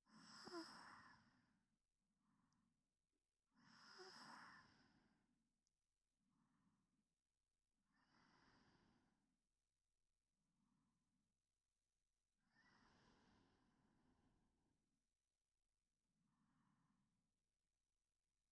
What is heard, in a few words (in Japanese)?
添い寝 左耳ver